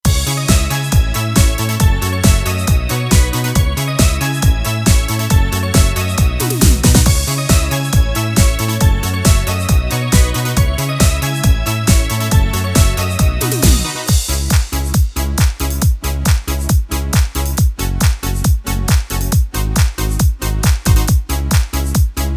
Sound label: Disco